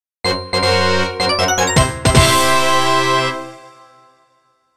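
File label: FANFARE (GS Arrange Version); Namco Sound Museum from X68000; GS Arenji Baajon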